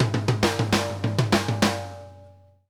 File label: ALL ARND, drum fills; Sampleheads - New York City Drumworks VOL-1